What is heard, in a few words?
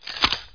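thermal click; MOTS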